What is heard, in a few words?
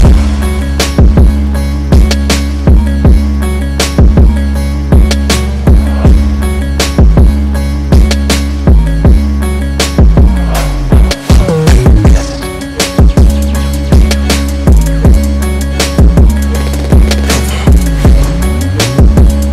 arabic iraqi trap music ringtone